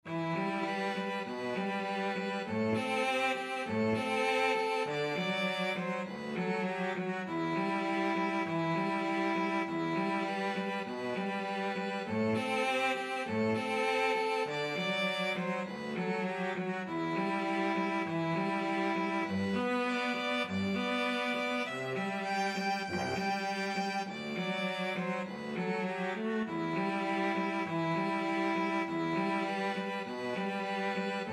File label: violin cello duet